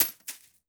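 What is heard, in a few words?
bullet case bounce 556 grass; gmod; viper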